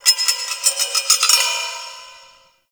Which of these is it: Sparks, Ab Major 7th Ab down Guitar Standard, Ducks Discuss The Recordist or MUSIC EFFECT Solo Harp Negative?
Sparks